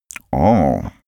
male enjoying hmm; koerpergeraeusche; menschen; soundpool

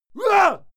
male fight attack hit; schreie; menschen; soundpool

